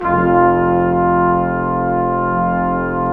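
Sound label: BRS QUINT, brass quintet; Roland L-CDX-03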